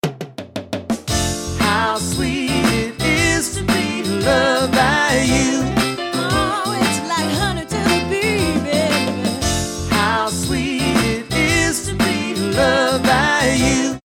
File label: SATB